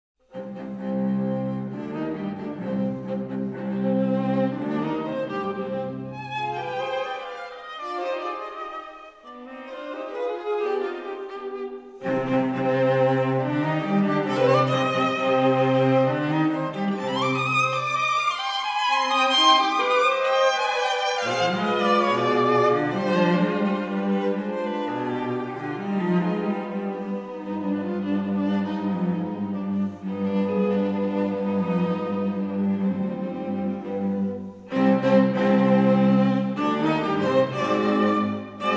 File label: String Quartet in C Minor; Ciurlionis